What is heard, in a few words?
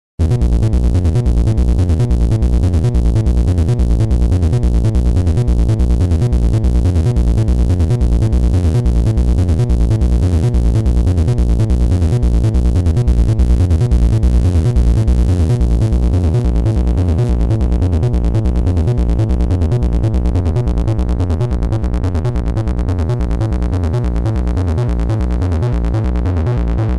analog synthesizers sequencers; dr zee workshop